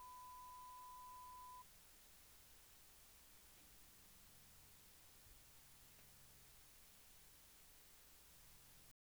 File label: Ribbon Zoom; Zoom H6; Ribbon Sample